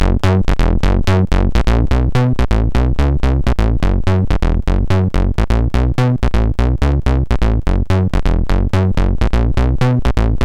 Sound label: Simple Bassline MOS6581R4